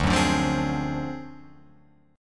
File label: D 7th Sharp 9th Flat 5th, Guitar Open F; strummed; JGuitar